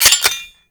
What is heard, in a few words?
Four Barrel Rifle Mech